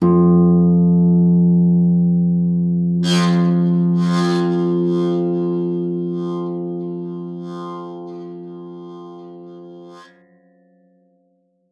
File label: PEDX E2, harp; Xsample VOL-8; HARP+FX